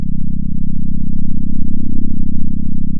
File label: pop, sound of a small motorcycle